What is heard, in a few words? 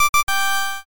quiz start